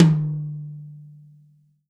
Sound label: TOM, Real Drum Kit